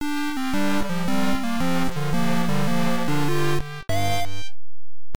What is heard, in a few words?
Lose; madou monogatari ars; pc-9801